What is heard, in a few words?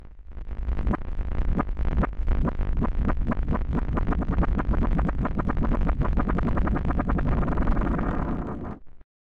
reversed creak